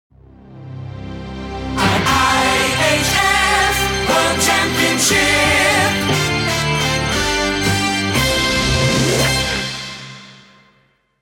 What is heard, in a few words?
Fanfare short with vocals; IIHF